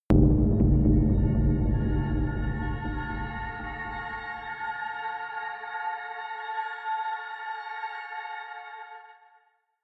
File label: horror shimmer, drones